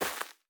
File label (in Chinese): 垂根踩踏声